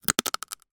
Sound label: human bite dried pasta; zapsplat